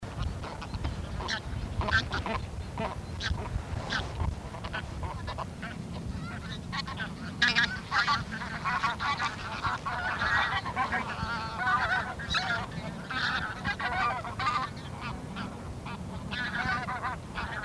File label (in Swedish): Sadgas